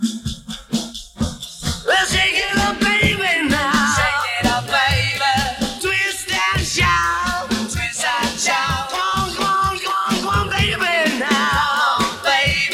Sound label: Vocals Drums